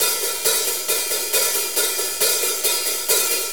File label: 4-4 HiHat Loop; Drum Tools